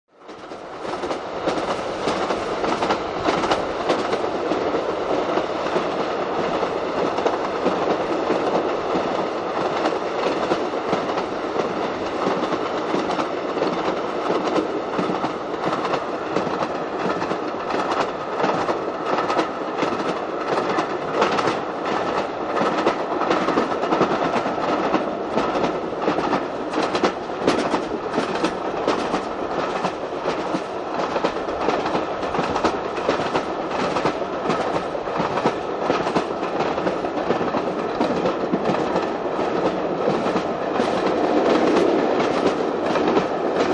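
Train Constant (Interior) Interior Diesel Train Travelling; Hollywood Edge 3-D SFx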